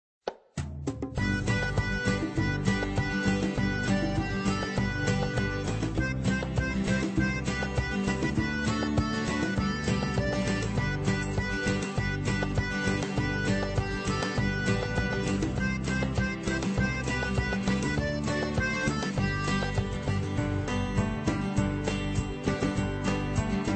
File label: jingle bells, enstrumantal